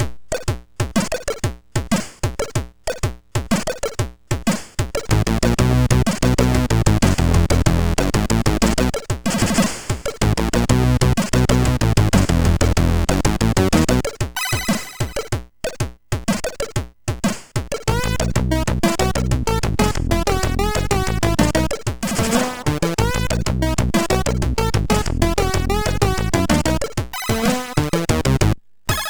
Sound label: Cartoon, sid MOS6581R4; Density